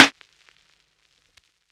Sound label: snare; dough-samples; eddyflux